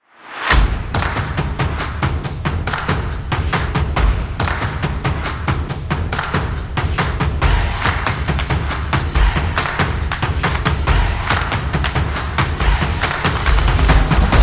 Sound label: Sport Drums; drums trailer